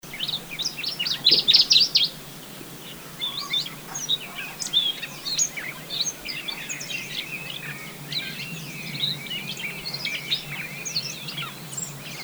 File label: Dusky Warbler Song; pouillot brun phylloscopus fuscatus; Guangxi Shanli